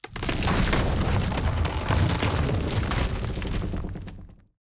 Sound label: action shiver timbers